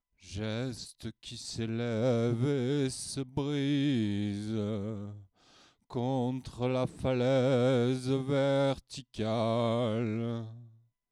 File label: Female Basic